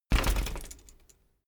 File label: bicycle bike land rattle back